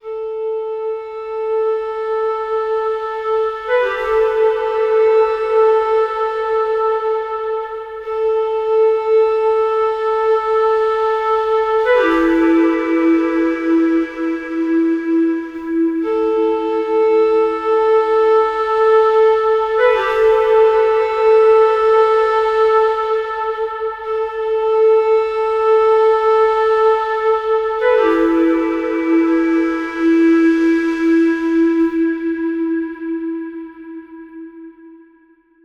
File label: Clarinet - Am 60Bpm; Construction Kits; STEMS; Ghosthack; SkyRealm; Kit 28 - Long Walk Home